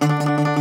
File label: Greek Bazouki, Plucked Strings